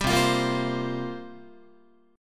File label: C 7th Suspended 4th, Guitar, chord